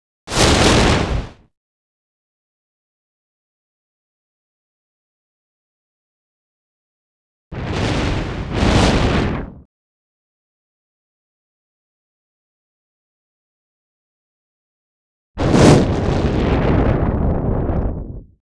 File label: fire fireball; Asian Show Sound Effects